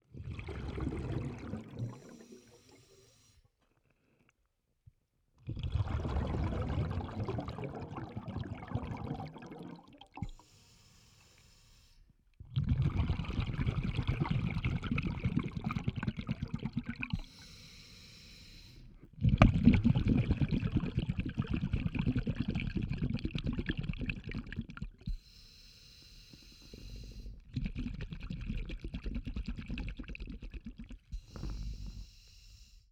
Underwater breathing approach cave, Scuba Diving equipment and underwater diving; Ivo Vicic